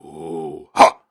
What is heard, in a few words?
Grunts and Chants, Viking Vocal